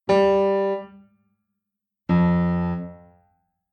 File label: Piano in Blue release